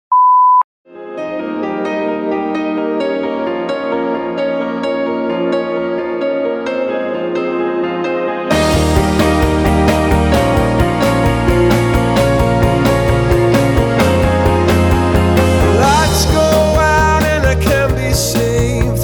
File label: man vocal progressive